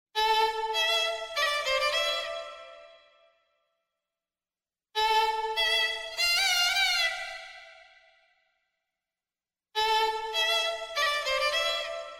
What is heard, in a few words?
best violin ringtone